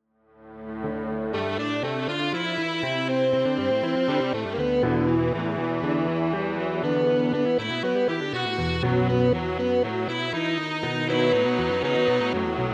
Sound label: Theme Death; Modern